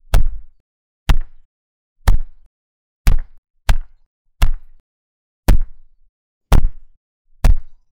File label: the sound of a heavy object hitting the ground with a boom